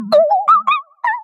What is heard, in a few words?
Distorted